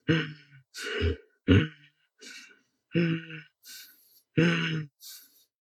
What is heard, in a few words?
inmate chase